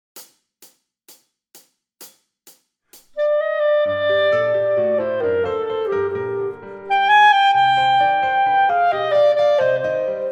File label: Full Clarinet